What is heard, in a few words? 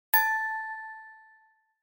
quiz wrong